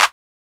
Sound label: Clap